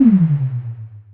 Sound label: future door close; soundeffect